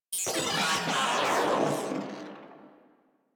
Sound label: Vocal Death, Enemies; Warframe; Moa